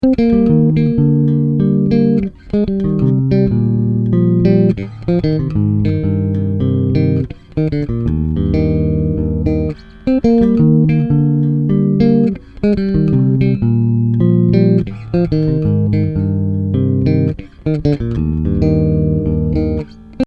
chords

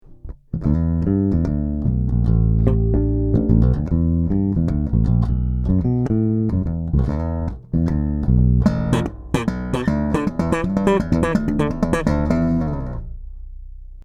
Marleaux Neck PU seriell

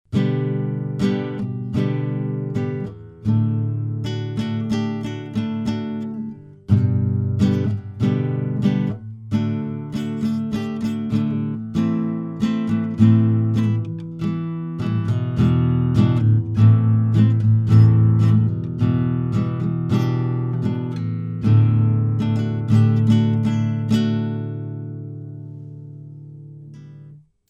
Lauten Audio LA 220 V2 LPF off HPF off ak Gtr

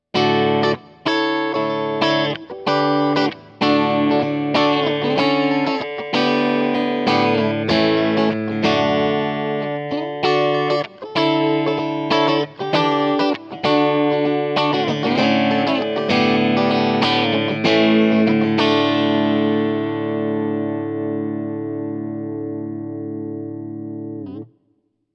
Middle PU Bridge Singlecoil Clean; Charvel Marco Sfogli Signature

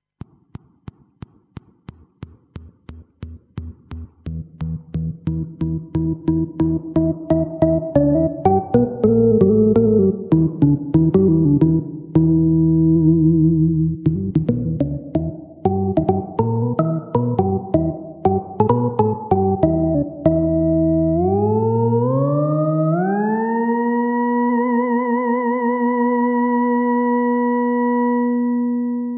Harmonics

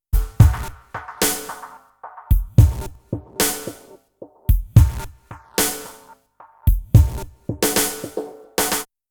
Loop Active EQ

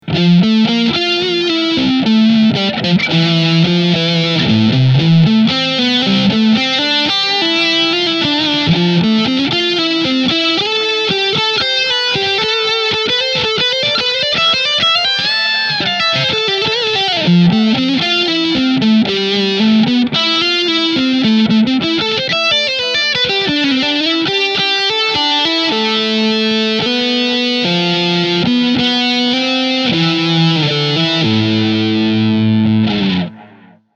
OD1 Delay; Blackstar ID Core 40 V3

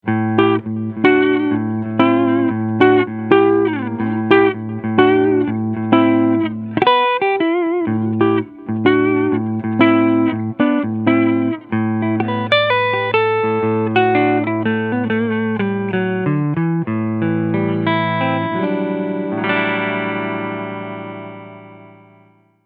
PRS Hollowbody II Piezo Clean Sound Neck HB

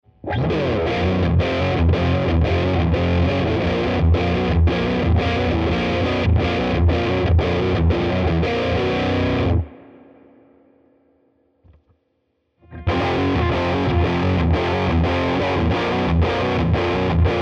Riff full Drive mid Res Cutoff

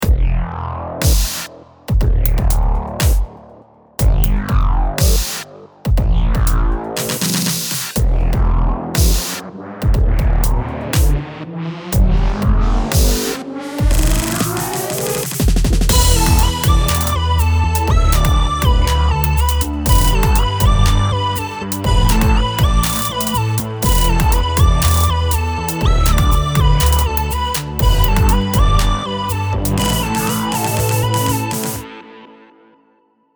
dubstep big room

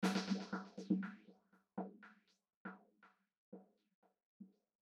filtered delay

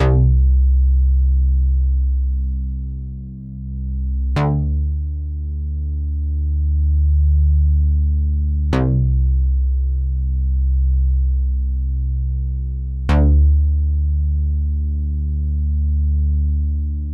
OB6 Factory Preset; portiert auf P6

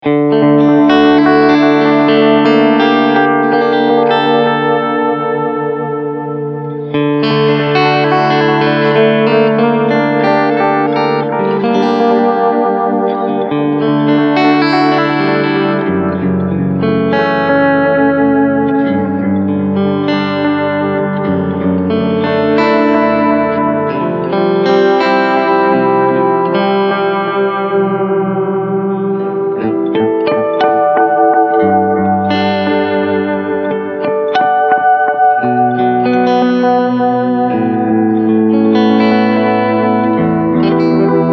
Delay Mod

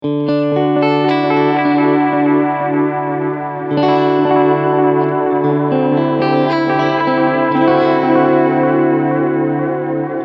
Medium Delay

